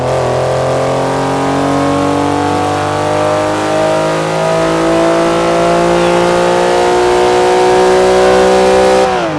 third, vehicles; 997gt3

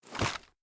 raise first end

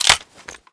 hybrid flip down, foley; gmod; viper

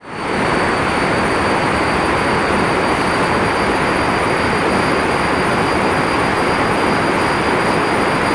mid throttle, tesla models; vehicles; gmod; tdmcars

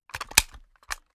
weapon selector off; gmod; viper

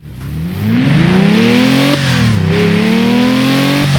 first, vehicles; mer g65

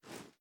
reload arm; reloads; iw8; uzulu; gmod

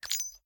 charge reload fast shell eject; gmod

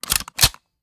reload empty xmag charge; reloads; iw8; uzulu; gmod

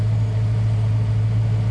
idle, vehicles m3e92

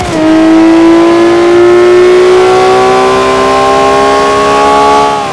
third, vehicles; gmod; tdmcars; reventon

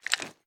drop

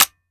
fire first; viper

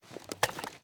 ar akilo47 drum reload empty fast raise; weapons; viper

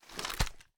inspect; gmod

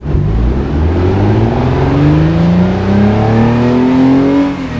first, vehicles; gmod; tdmcars; wrangler

